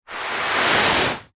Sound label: household cleaning wiping carpet with dry tissue paper single long wipe; zapsplat